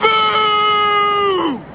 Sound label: booooo